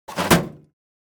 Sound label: Metal drawer closing